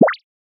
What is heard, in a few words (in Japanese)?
ぴょこ